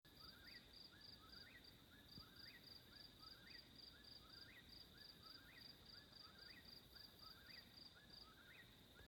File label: Eastern Whip-poor-will Antrostomus vociferus; Dave Webb